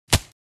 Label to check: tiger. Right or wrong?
Wrong